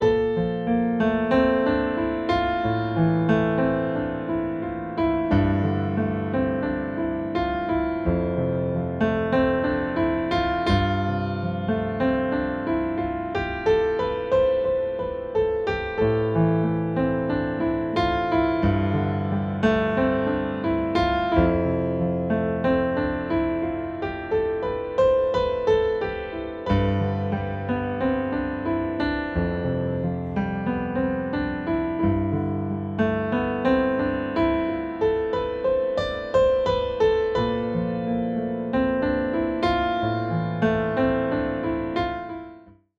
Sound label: Oboe